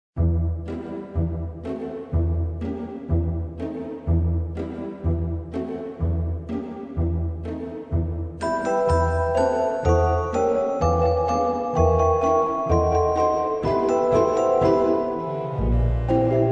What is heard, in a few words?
Nutcracker